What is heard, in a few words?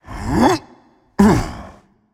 grunt jump; smite; anhur